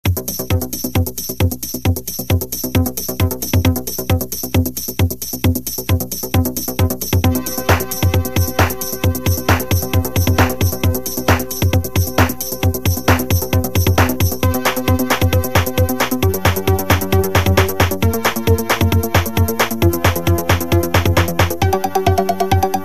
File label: Hardcore Disco (instrumental)